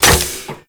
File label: Rocket Launcher Reload